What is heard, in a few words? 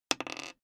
die roll table